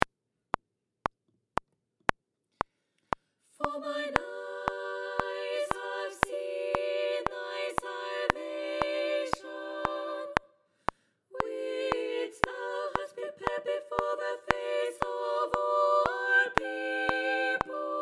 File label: section b bass